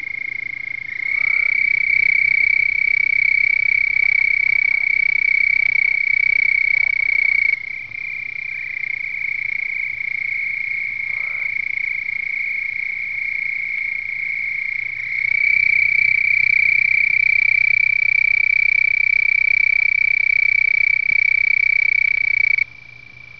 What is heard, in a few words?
b. houstonensis, frogs